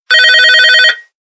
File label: office ringtone; ringtones